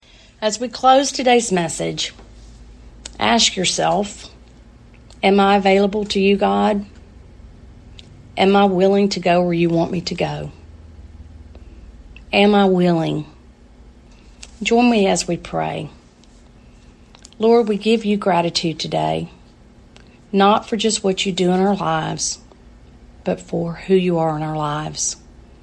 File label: Benediction and Closing Chorus